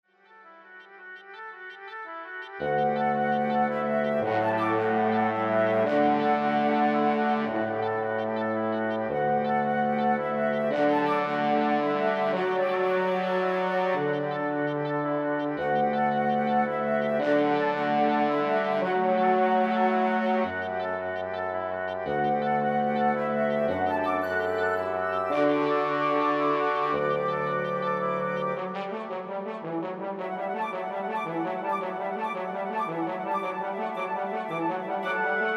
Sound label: Woodwind Quintet